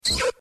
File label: cry; Jigglypuff